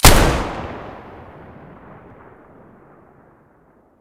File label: m590 muzzle stereo; mossberg